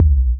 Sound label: Kick, Kickdrum; Equipped Music; Loungin House